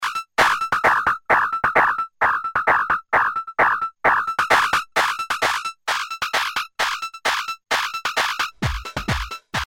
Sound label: drums; FreqBox